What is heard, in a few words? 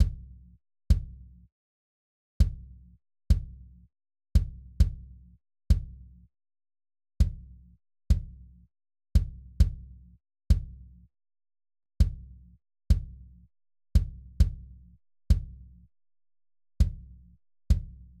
kick